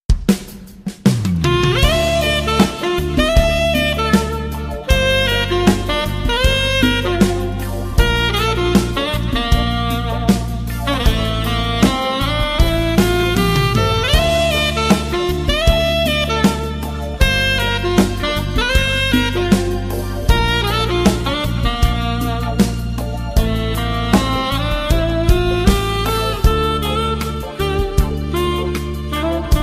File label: saxophone cover